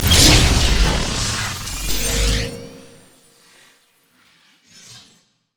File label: upgrade; Cyber Ezreal